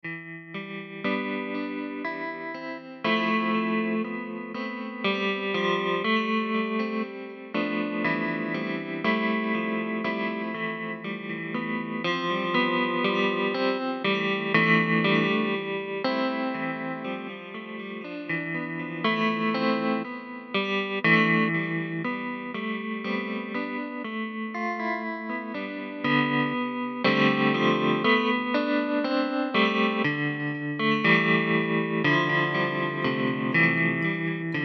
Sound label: Electric Spring Rain; souffle